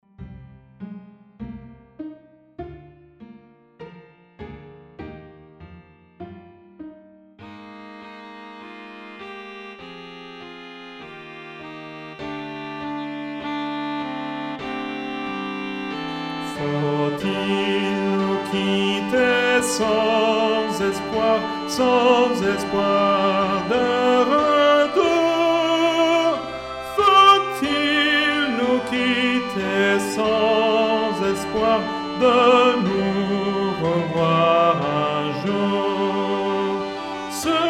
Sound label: auld lang tutti